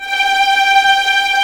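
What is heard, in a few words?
G5 tremolo, strings; East West; The Ultimate String Collection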